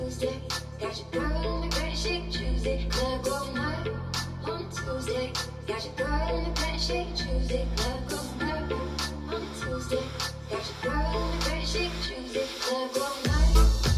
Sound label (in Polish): elektroniczna poglos